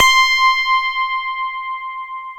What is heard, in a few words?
DX DYNO C5, Electric Pianos; E-MU Formula 4000 Series Vol. 3; Analog Odyssey